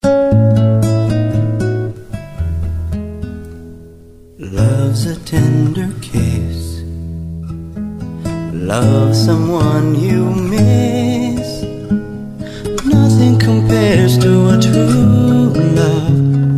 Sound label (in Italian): canto